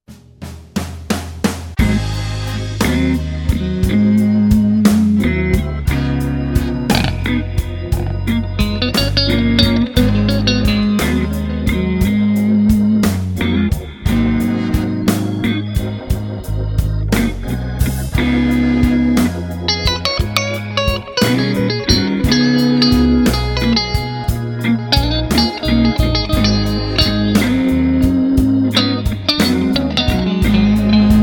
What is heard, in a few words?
Fender squier usb guitar; MacBlues